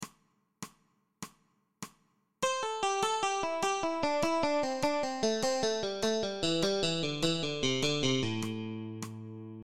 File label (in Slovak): osminove trioly nadol priklepy uvolnenia; cvicenie